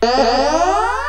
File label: beem